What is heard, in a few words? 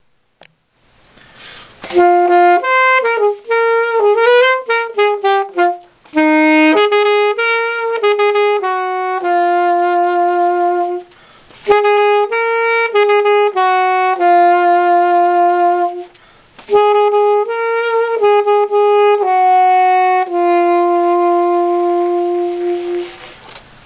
Sax line